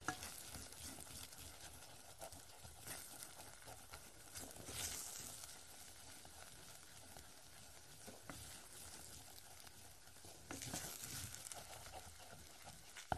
household kitchen cooking eggs scramble stir sizzle pan